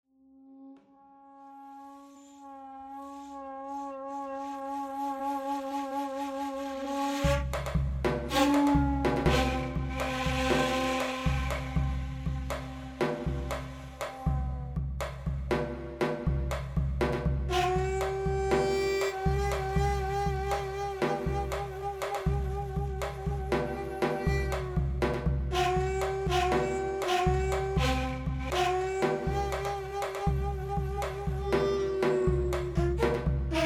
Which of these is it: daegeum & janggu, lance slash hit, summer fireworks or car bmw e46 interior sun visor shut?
daegeum & janggu